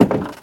bridge step; Golden Crayon Sounds

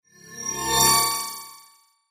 invisibility start; Original TK Sounds

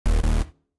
player stats error; Original TK Sounds

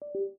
Windows Hardware Remove; Windows 11; Dark

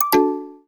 Braille Display Disconnected; finn's sounds

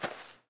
grass step; Golden Crayon Sounds

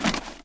fire step; Golden Crayon Sounds

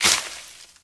wall bush leaves; Golden Crayon Sounds

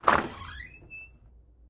door open; Original TK Sounds

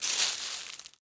leaves step; Golden Crayon Sounds

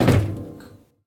metal land; Golden Crayon Sounds

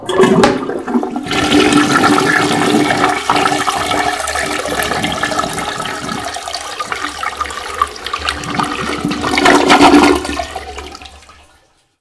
toilet flush; Golden Crayon Sounds

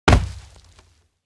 hardwood fall; Golden Crayon Sounds

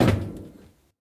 metal step; Golden Crayon Sounds

